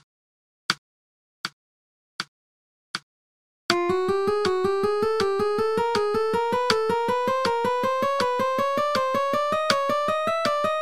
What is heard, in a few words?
alternate picking exercise, guitar